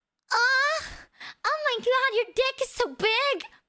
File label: aaaaah